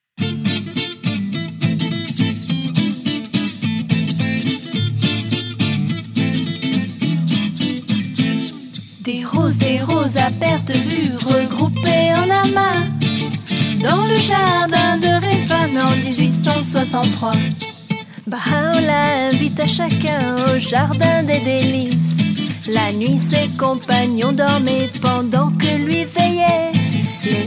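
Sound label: Song, French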